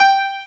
grand piano G4; Star Trek JR Audio

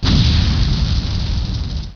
VOLCANO; Gub2dem

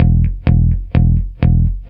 Muted quart notes, Rock Bass; ACID MUSIC

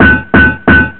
CASTLE D; Easy Personal Web Page

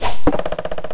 fly knife; voicekit

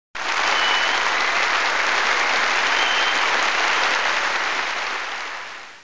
clap; KARUTA

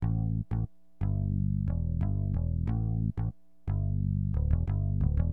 BASS, HipHop; LiveAct Pool

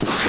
success; Firefox

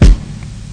smooth bassdrum

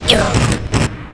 pain; QUAKE2; megaman

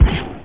PlasHit

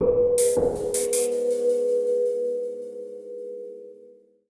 PERCUSSN AMBNT 160, Ambient, Drum

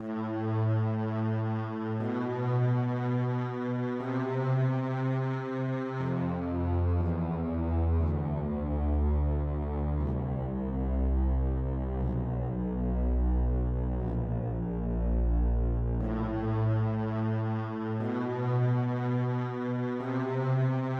drone

